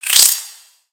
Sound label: Spider Attack Melee; creatures mechanical; Lego